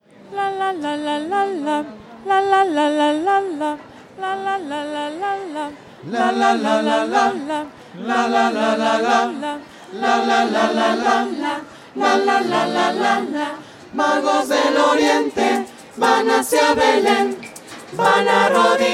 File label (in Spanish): reyes de oriente voces; misa